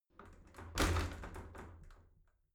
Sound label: shower slide door rattle hit